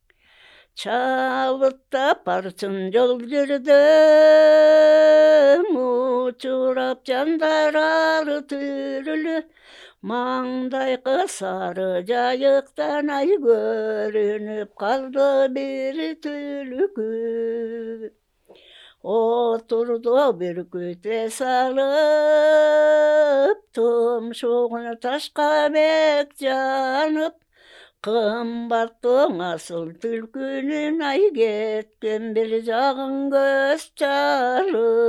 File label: song